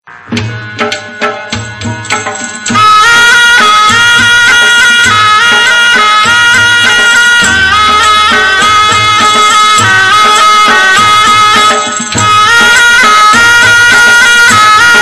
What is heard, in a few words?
Kabila Music